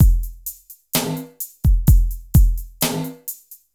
CB LOOP; Zero-G; Chemical Beats

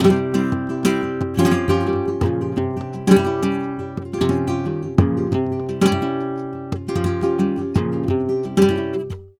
GTA 175, Flamenco, TANGO GTR

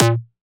Mid Tom; Roland MV8800; HARD MM KIT

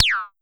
Pollard Perc, Syndrum

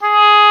Oboe mf G#3; Digital Sound Factory Virtuoso

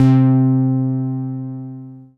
bass, Dance Mega Hip Hop Electro; EastWest

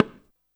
bongo; InVision Interactive; Percussion Slam; KWICKIE

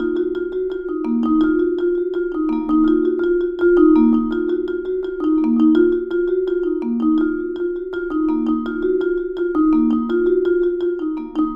Vibes; Cinematic Funk; Sonic Foundry (Sony Creative Software); Cinematic Funk Urban Tone Poems

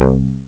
Deep Bass; ST-XX Amiga Soundtracker Sample Packs

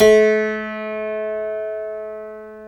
PNO, Tack Piano; Club-50 - Foundations Roland

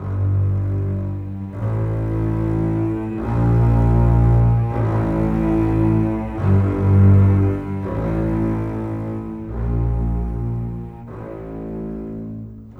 Bass Cello, Cinematic

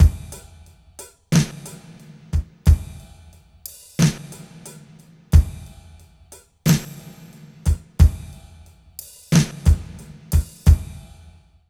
124 LOOP; East West - Phat & Phunky CD2; 90's Sample CDs

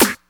SNARE, Hip Hop; E-MU Formula 4000 Series Vol. 1; Hip Hop Nation

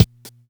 Loop, Yamaha DD-5; Yamaha DD-5 Ableton Project; Processed

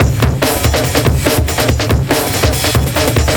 drums, 142 Dmin, Hardcore Breakbeat; Big Fish Audio; Hardcore Breakbeat Action; Shake That Booty

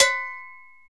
cowbell, Latin; Roland L-CD701; PRC Latin 1

